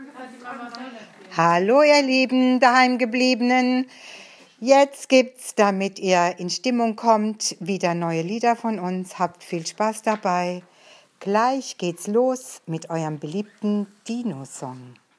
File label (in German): alle mal mitsingen!